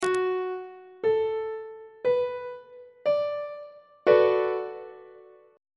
e double flat major 6th 1st inversion on treble clef